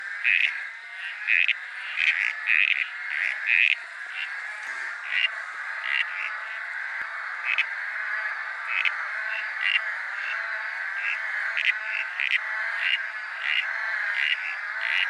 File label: pseudophryne coriacea; Red-backed Broodfrog